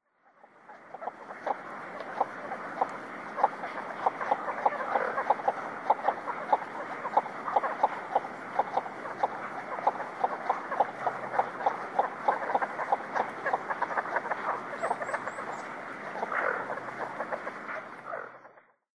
hashibirogamo, sano, koe; ハシビロガモ; 栃木県佐野

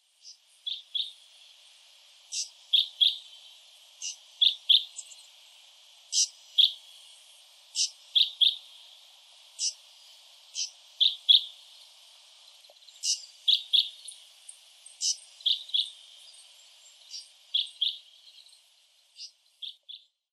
haiiroochu, saeki, koe

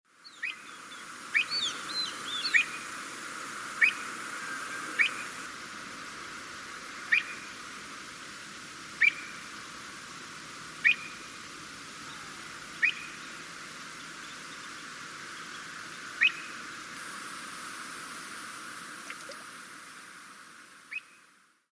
sorisei, bird-research

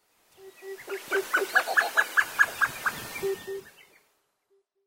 tsutsudori, rausu

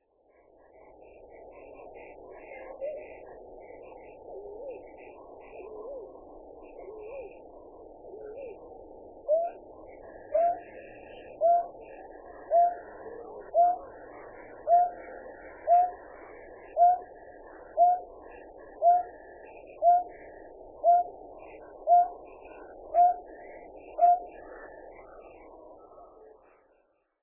tamashigi, hyogo

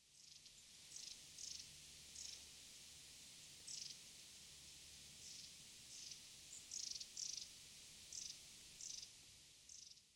shimaenaga; sapporo

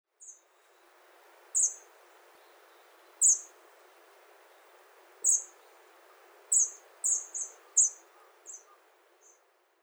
shime, bird-research

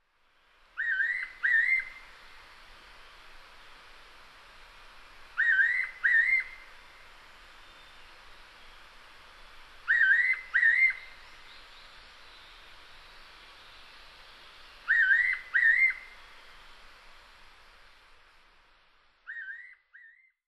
yairocho, nagano

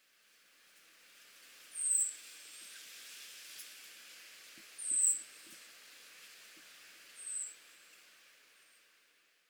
akakokko, koe; kuroda

